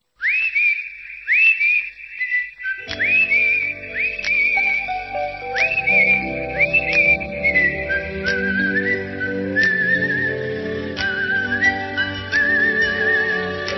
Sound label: whistle theme